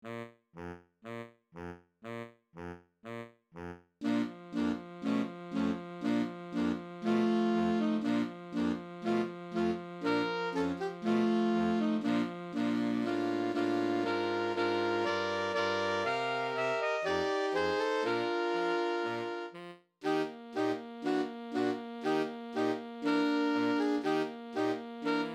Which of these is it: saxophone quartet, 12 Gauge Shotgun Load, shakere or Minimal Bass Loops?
saxophone quartet